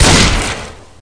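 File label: fire scimitar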